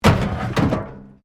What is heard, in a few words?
METAL BIN IMPACT PLASTIC CRASH BARRIER